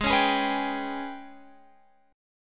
Ab Minor Major 7th Double Flat 5th, down, Guitar, Open F; strummed; AbmM7bb5